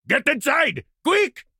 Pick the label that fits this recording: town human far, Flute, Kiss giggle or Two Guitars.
town human far